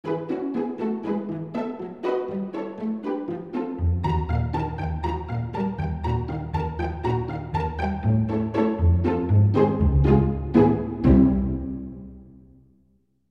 Full Piz; xv-5080; SRX

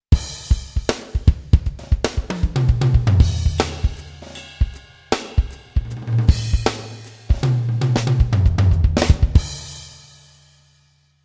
Poppin KIT; xp-80; roland; patch demo; roland demo cd-3

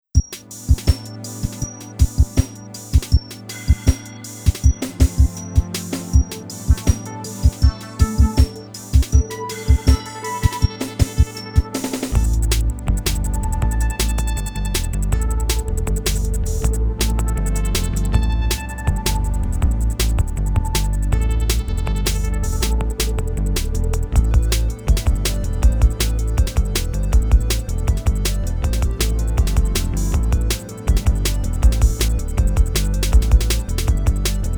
dance kit; xp-80

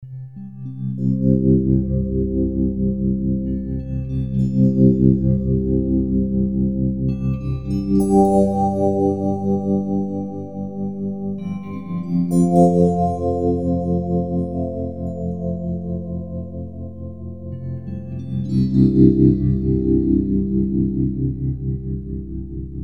Himalaya Thaw; fantom-x8; patch demo

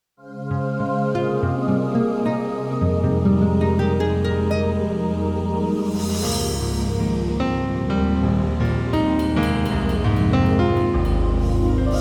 session; xp-80; roland; Multi-patch demo; roland demo cd-3

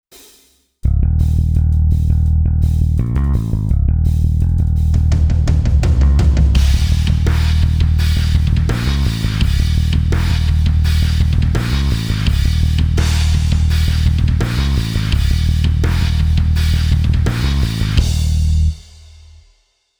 bass and drums; Multi-patch demo 6; xp-80; roland demo cd

